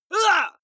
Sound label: male fight hit shout; comedy-cartoon; soundpool